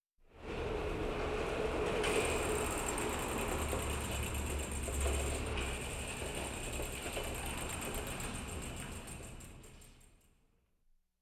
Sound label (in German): fahrrad in tiefgarage; Auto-Zweiraeder